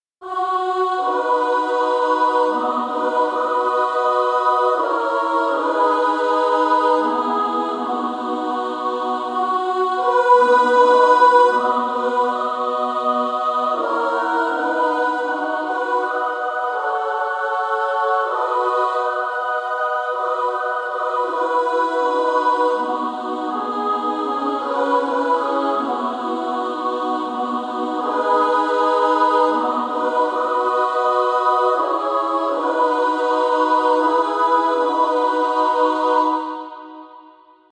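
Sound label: soprano soprano alto, Female Choir, 3-part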